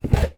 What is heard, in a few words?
potty plastic pick up from floor, household